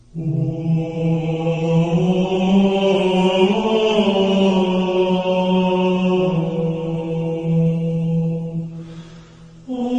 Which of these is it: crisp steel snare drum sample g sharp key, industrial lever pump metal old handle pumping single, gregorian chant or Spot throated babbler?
gregorian chant